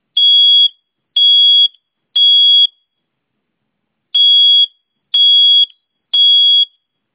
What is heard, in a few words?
Smoke Alarm